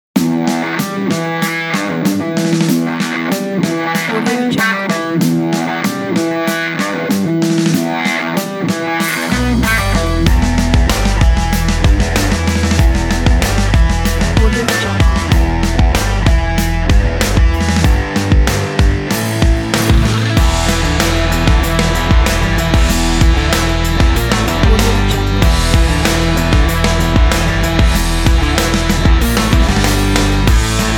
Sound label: rock